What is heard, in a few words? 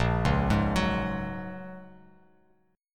Bb Minor 7th Sharp 5th, Bass Standard; arpeggiated; JGuitar